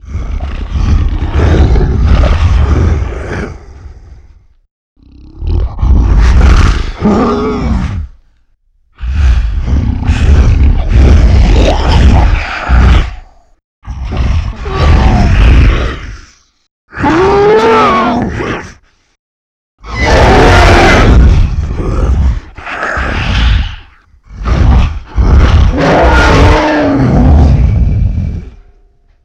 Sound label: Roar & Scream, Vocals Monster; Sony Pictures Sound Effects Series